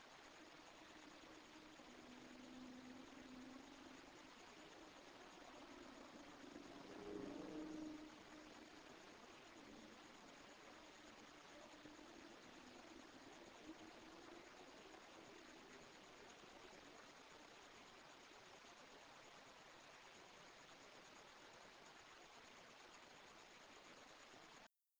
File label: Growl